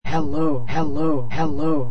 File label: butterfly ramp; nyquist